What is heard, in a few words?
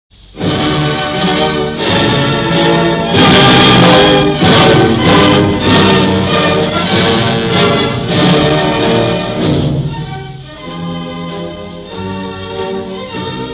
Portuguese National Anthem